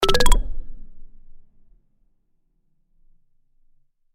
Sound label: notify; Kvakksalver